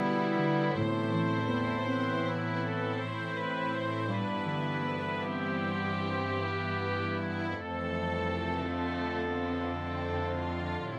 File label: Sad Scene